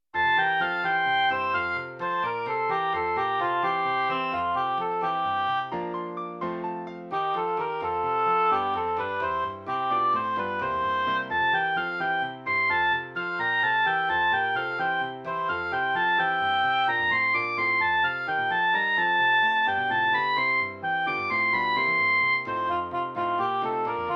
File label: A Lilting Song